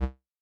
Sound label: Run Stop